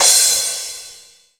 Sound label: Cymbals crash; Vengeance Samples